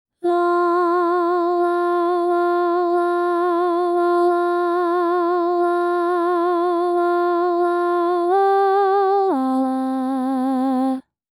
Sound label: Alto